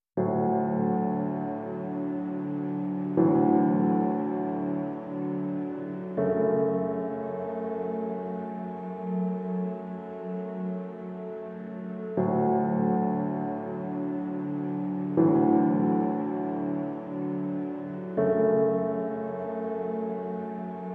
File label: No Ambience No Hang Drum Mix